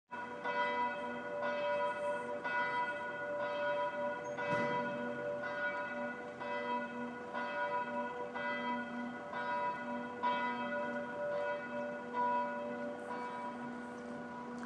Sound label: church bells are ringing